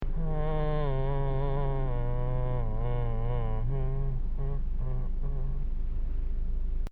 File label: moan